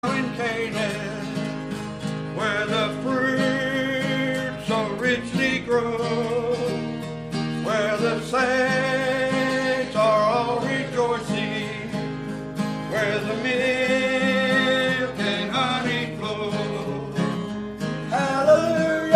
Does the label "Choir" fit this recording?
Yes